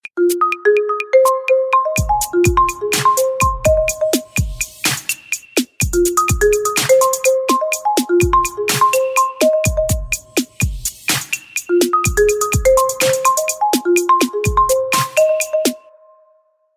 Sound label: Random Notes, ringtones; Infinix NOTE 30